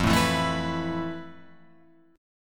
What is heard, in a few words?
F Major 7th Flat 5th Guitar Open F, chord; strummed; FM7b5; JGuitar